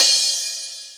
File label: HIHAT HIP; Best Service Dance Mega Drums